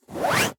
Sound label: Scrape Cloth; SoundBits; Tiny Transitions 2